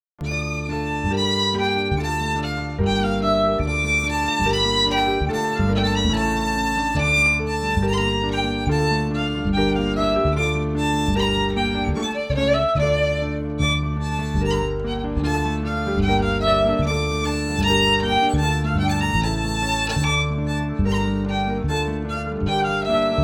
FIDDLE PIANO; Jink & Diddle Book o' Tunes 2022

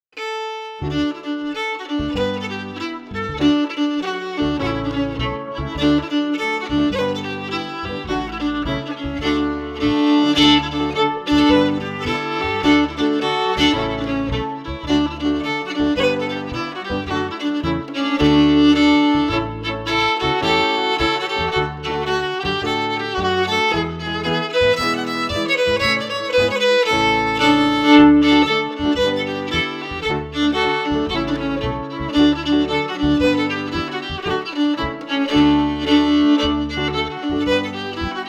Jig JIGG FIDDLE PIANO; Jink & Diddle Book o' Tunes 2022

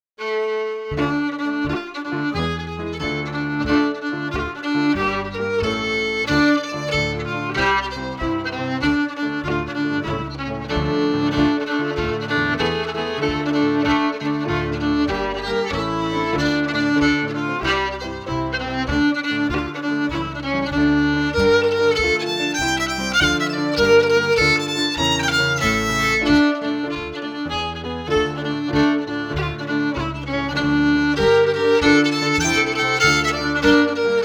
JIG FIDDLE PIANO; Jink & Diddle Book o' Tunes 2022

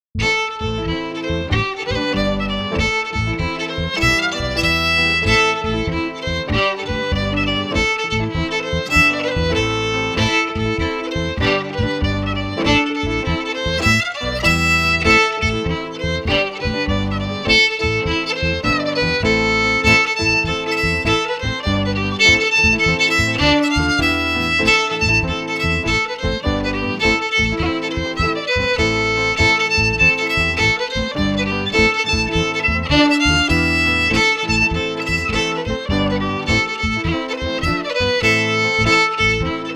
FIDDLE PIANO; Jink & Diddle Book o' Tunes 2022

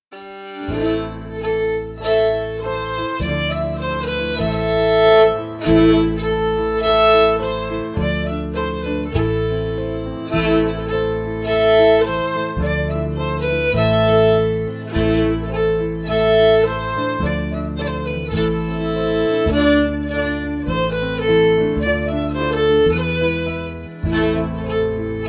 FIDDLE PIANO; Jink & Diddle Book o' Tunes 2022

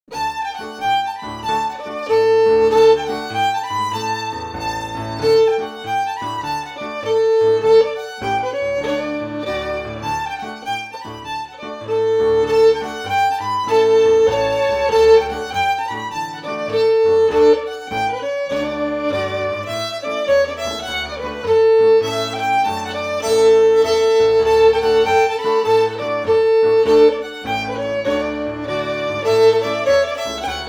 FIDDLE PIANO; Jink & Diddle Book o' Tunes 2022

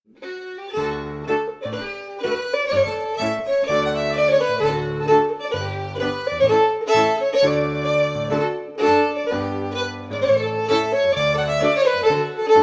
FIDDLE PIANO; Jink & Diddle Book o' Tunes 2022

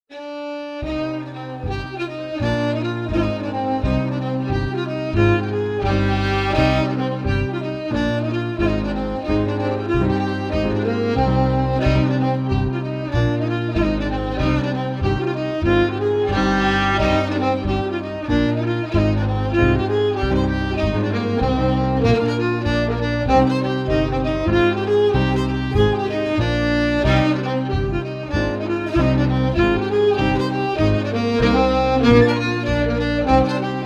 FIDDLE PIANO; Jink & Diddle Book o' Tunes 2022

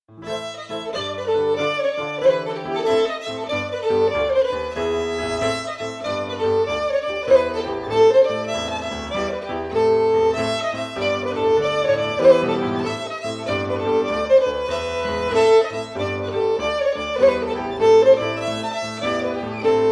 Muckin o Jim Craig s Byre, The - FIDDLE PIANO; Jink & Diddle Book o' Tunes 2022

